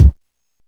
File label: Kick Vinyl, Drums